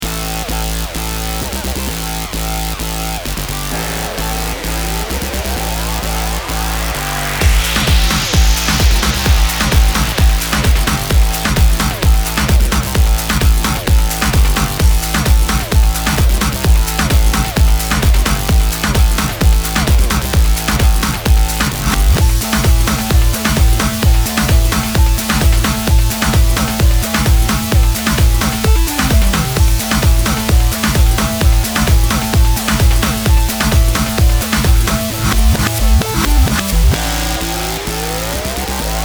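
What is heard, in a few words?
background music electro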